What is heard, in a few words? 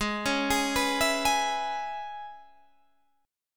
C sharp Minor 7th; Gsharp